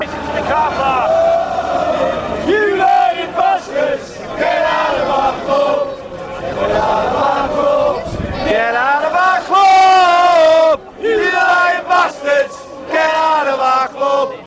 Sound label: get out of our club fan chants